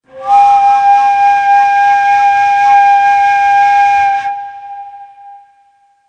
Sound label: Acem, Ney